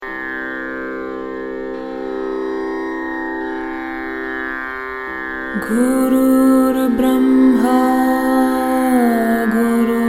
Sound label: Raga; Void area; Meditation Music